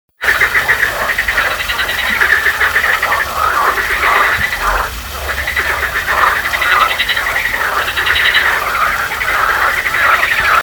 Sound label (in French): Grenouille Rieuse Chant